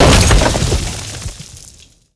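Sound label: break wood large; Spearhead Demo; MacFormat 2003 June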